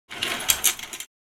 Wooden rail curtain rings slide dry squeak; blinds

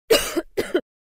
Female cough

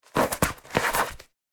Inflatable armbands removing

